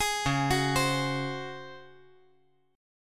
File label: Db Major 7th Suspended 4th Db down; DbM7sus4 arpeggiated; JGuitar